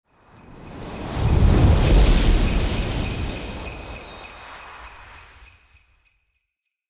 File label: Santa claus arrival magical christmas